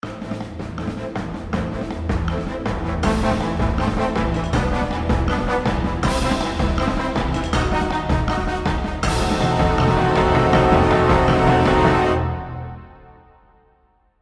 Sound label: theme round ending